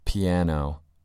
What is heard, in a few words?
piano